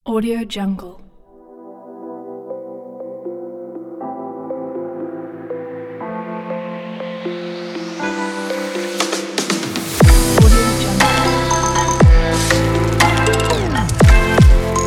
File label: atmospheric modern